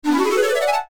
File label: Hero Level Up; Heroes of Might and Magic: A Strategic Quest; 3DO; MS-DOS